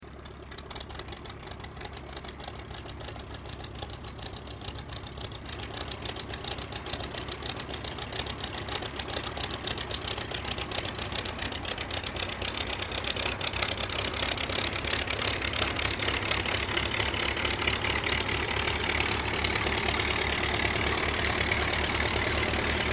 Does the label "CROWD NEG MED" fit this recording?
No